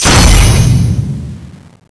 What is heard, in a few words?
magic shoot; mecha titan dogday